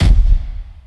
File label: Reverb Kick